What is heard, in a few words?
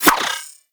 Skip Rope Whoosh; Warframe; Characters; Pets; Moa; Tenacious